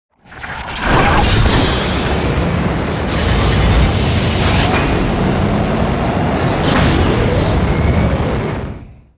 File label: recall lead in; Vel'Koz